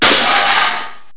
CRASH MET; 7invasores

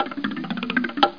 ladder; Tempra Access; vision

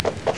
horse restore up

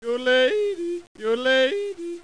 yodel; AB3DII

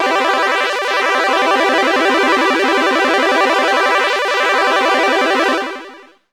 chords long

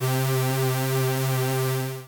string

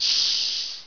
Sizzle; Effects; Worms 2

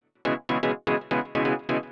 synth house 125 A; eJay; Keys

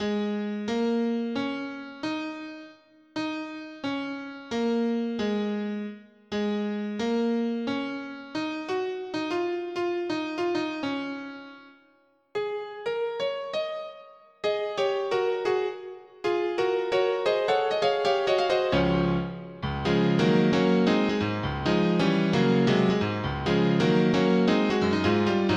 rhythm

